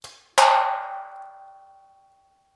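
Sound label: Paintball Gun Impact; Sound Ideas; Guns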